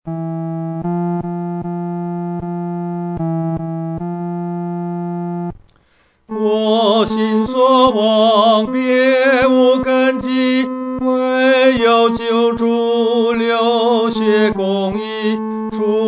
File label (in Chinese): 独唱 第三声; 坚固磐石